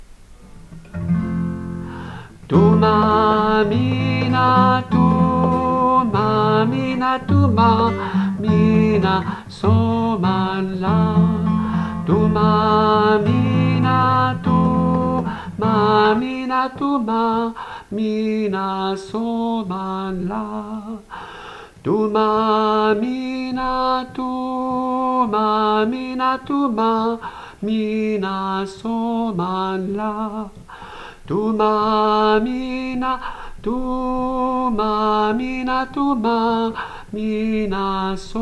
Thuma mina tenor, chorale

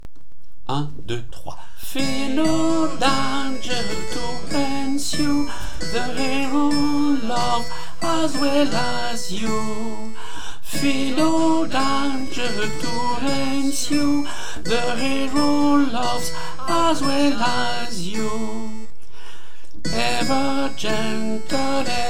SOP, chorale; Purcell suite2

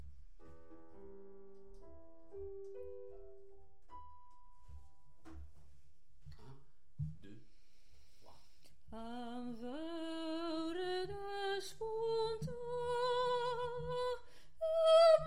ALTO, chorale; El Cant del Ocells

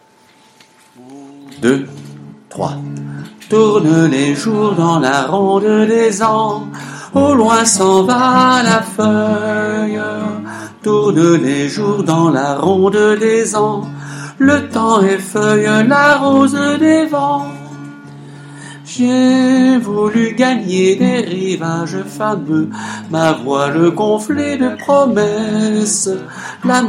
sopranos, chorale; ronde

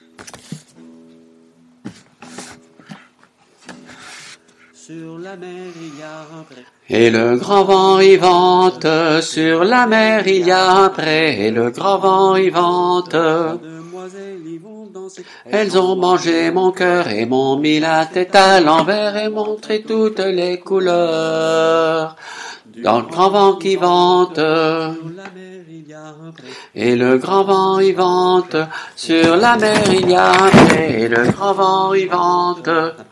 Sopranos, chorale; Grand Vent

